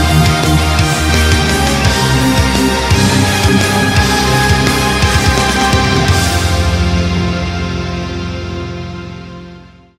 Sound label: outro music; gearmaster